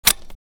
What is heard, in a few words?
mp5 slap; midnight guns